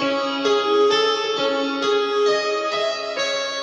keys, Sad Loops

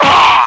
scream

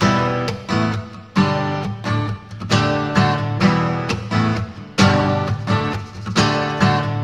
guitar upbeatnik, Loops, Phonky

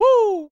woo

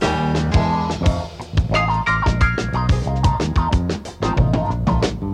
strings, Loops; Phonky

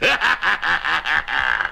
laugh devil; ooh ahh

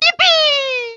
"yippie"; ooh ahh

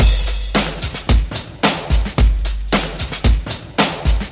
faster paced, Boombap drum loops

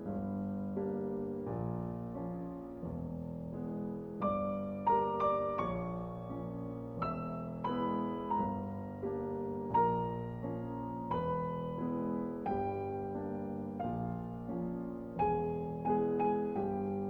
piano, Sad

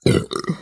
burp short

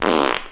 fart short

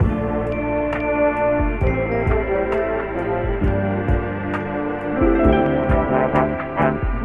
horns, Loops

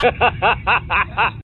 laugh evil

